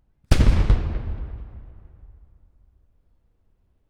Cannon Half Left; Telarc Sampler, Vol 5

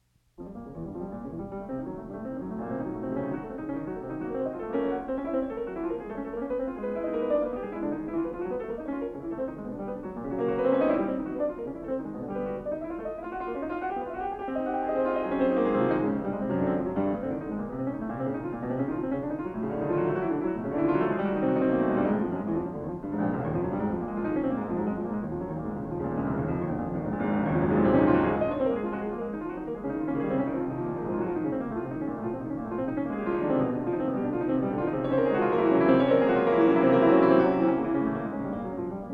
Piano Sonata in B flat minor, Presto; Mozart; Beethoven

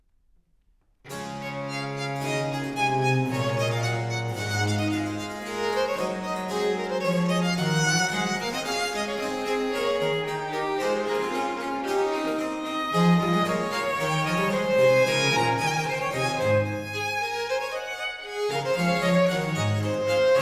Sonata in D major, Allegro- Adagio, Trio Sonatas; Handel

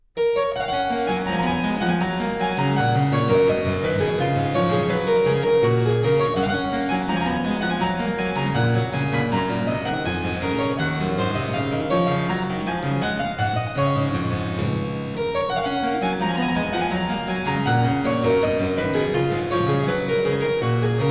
French Suite, for keyboard in G major Bourree; BWV 816 (BC L23); Bach; The French Suites

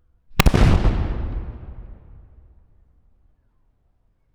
Cannon Hard Right; Telarc Sampler, Vol 5

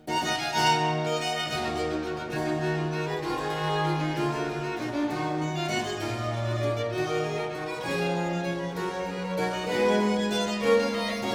Courante; German Chamber Music before Bach

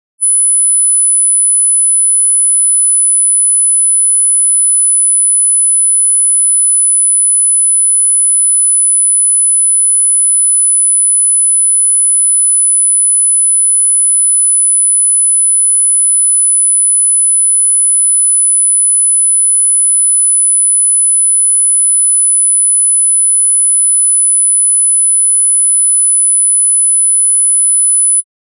9999hz Sine Wave 0db R; Denon Audio Technical CD

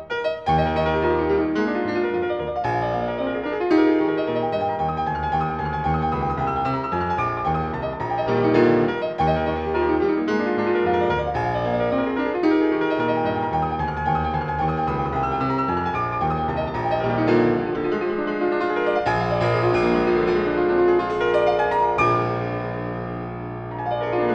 Variations and fugue for piano in E flat major

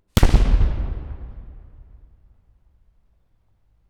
Cannon Half Right; Telarc Sampler, Vol 5

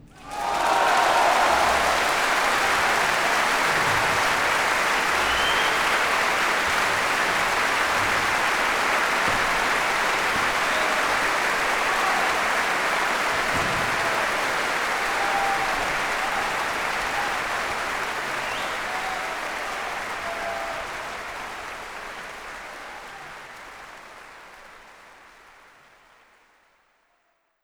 Applause; Mahler; Symphony No 5

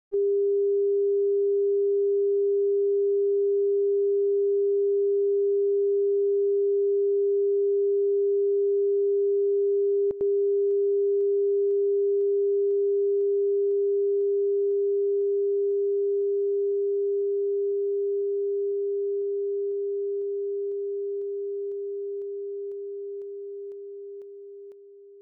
401hz -20db (Stereo); Denon Audio Technical CD

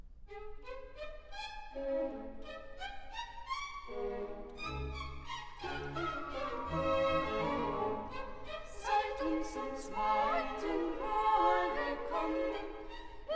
opera, Terzett; (The Magic Flute), K. 620; Act 1. No. 6.; Mutter! Mutter!; Mozart; Die Zauberflöte

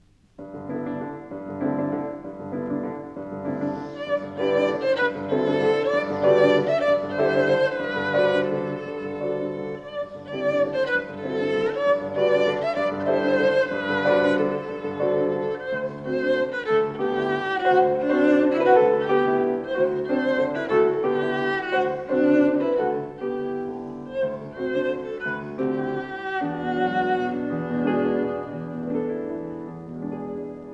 for violin & piano; Suite Populaire Espagnole, for violin & piano (arr. from "Popular Spanish Songs" by Kochans; Jacqueline Du Pré

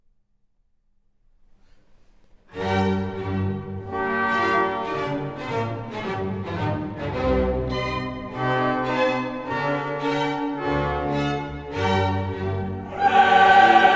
Requiem for soloists, chorus, and orchestra; K． 626; Sequenz; Rex tremendae; Mozart

